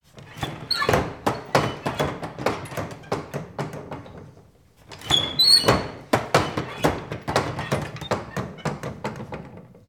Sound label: Saloon Doors